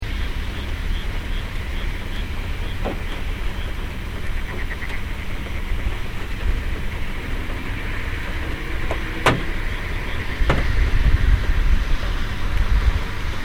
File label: frog